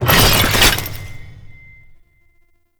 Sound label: Customization UI Equip; Veilbreaker; Kahl